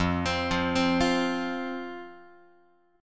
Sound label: F 5th F, down, Guitar; F5 arpeggiated